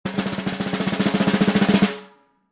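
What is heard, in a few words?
Drums Snare Med Crescendo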